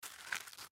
Pamphlet Pick Up Cement; Paper; Office; Blastwave

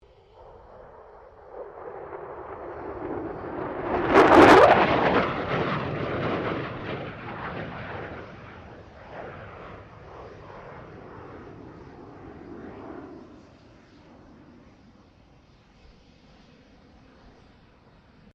F16 Falcon pass; Airplanes; Vehicles

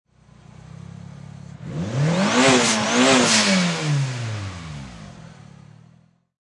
Mitsubishi Eclipse Engine Double Rev; Auto, Power Car, High Performance Car Sound; Engine perspective. Motor rev, revving twice.; Race Car Sound Effects; LynneMusic

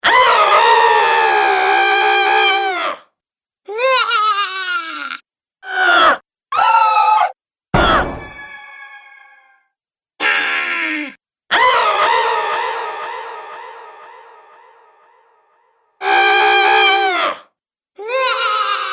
Scream Witch Soundpack, Screaming Sound Effects

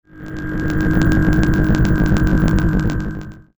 Eerie Short Fuzz Swell Crackle; Warped; Blastwave

